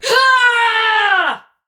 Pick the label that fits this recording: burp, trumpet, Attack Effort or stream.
Attack Effort